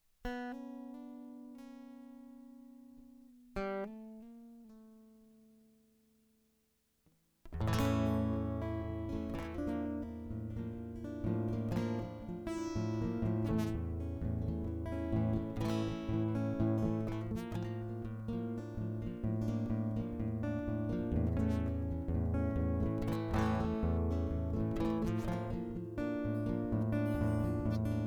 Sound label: Guitar Loop